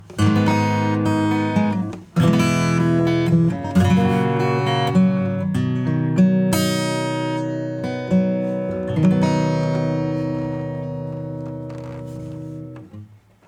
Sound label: Limit acoustic; SSL X